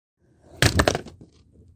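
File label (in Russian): скейтборд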